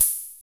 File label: HH, HH Synth